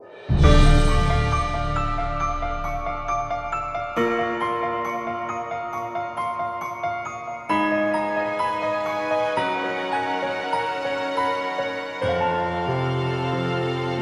BGM Lose; octopath traveler champions of the continent

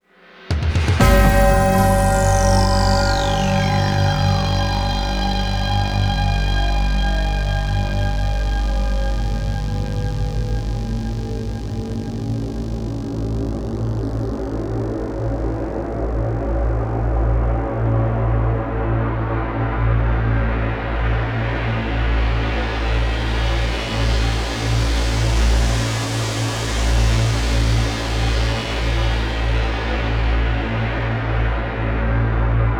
Menu Front End; Zombie Army 4 Dead War

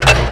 Gun Failure

